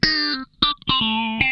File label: FAZE PLUK, Funk Guitar; 90 s Sample CDs; Zero G